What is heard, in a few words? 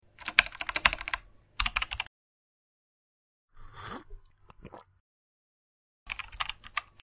typing keyboard